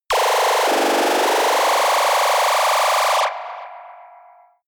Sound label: general ufo laser machine gun